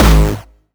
Distortion Kicks, Bassdrums

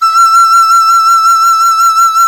BAN-DI FLUTE E6; Spectrasonics; Heart of Asia

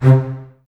Basses C 3, String Ensembles; Miroslav Vitous; LINKS ORCH

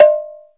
khole na, Ethnic Percussion

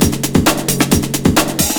SPEED, Big Beat Drumloops

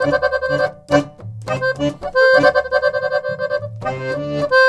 Balkanish Traditions; USB Soundscan vol.63; AKAI

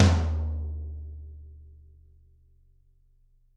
Toms, Drums; Bob Clearmountain Drums I

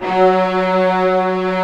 STRING SECTION F#2, Strings; AKAI S6000 CD-ROM - Volume 1

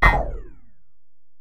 EPerc, Alesis DM-PRO

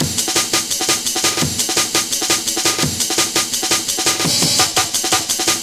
Kickin Break, Drum & Bass, Beats & Breaks

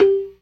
PERC, ORGAN; Keith Emerson Signature Series

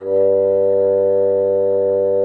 bassoon G2; Xsample VOL-6

